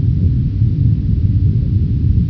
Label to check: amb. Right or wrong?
Right